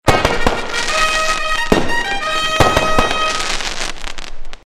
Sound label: level up